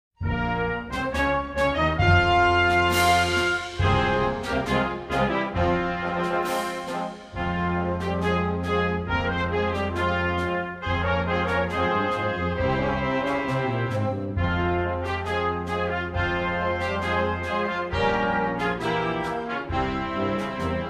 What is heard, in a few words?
Cherifian Anthem instrumental